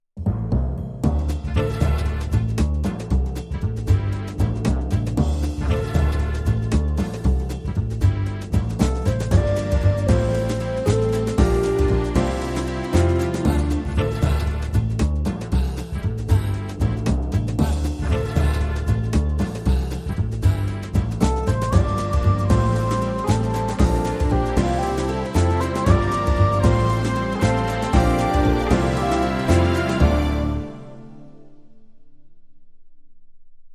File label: With Accordion